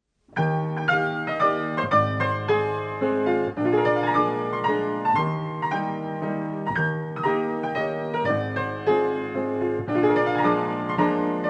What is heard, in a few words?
mazurka; badarczewska